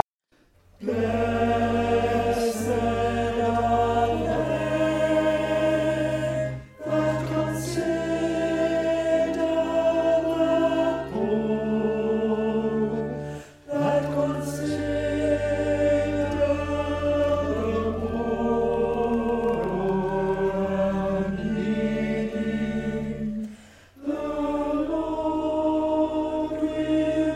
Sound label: Tenor